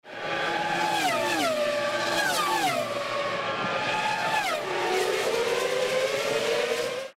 f1 race pass

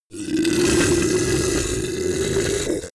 beast choke

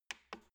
embedded button press

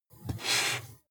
marker mark soft short

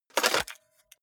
cassette out of cassette player

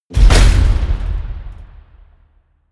metal crash medium

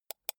computer mouse wheel click soft

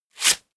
scratch

